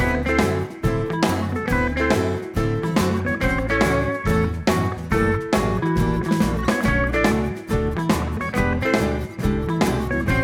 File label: Diner Jukebox